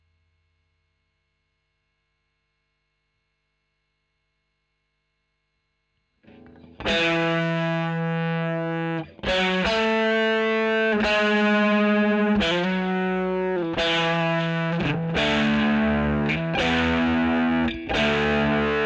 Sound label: E. GTR Royer OD